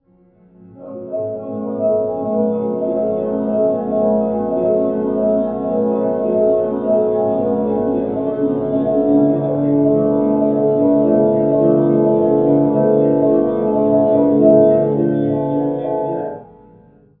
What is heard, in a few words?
IR reverb; Digital Audio FX